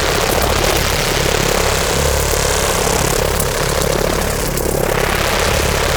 Mega Laser Loop; Teralyst; Ropalolyst; Abilities

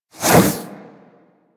Warfan Whoosh; InfWarfan; Warframe; Weapons; Melee

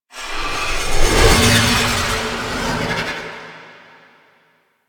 Eye Ring Settle; Ambience; Orokin; Warframe; Orokin Moon

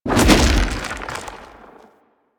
arm turret throw; Warframe; Man In The Wall

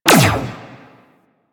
Laser Fire; Warframe; Teralyst; Vomvalyst

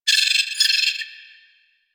Drifter Camp Valley Night Critter; Ambience; One Offs; Warframe; Quests; The New War

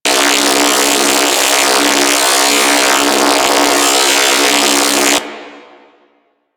Comet Shredder Laser Loop; Warframe; Corpus Railjack

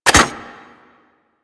Key Insert; Warframe; Colonist Rescue

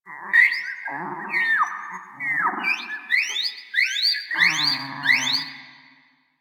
Drifter Camp Valley Water Creature; Ambience; One Offs; Warframe; Quests; The New War

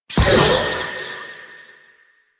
Wraith Throw Loop End; Warframe; Zariman

